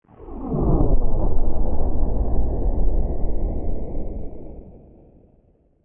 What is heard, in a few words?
Fighter Jet Pass By Distant, Distant afterburner; Airplanes; Sound Effects:Vehicles; Lynne Music